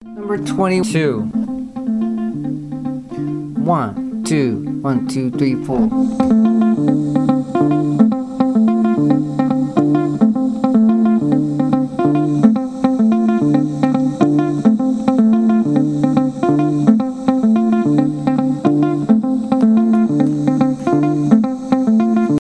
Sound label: mozambique patterns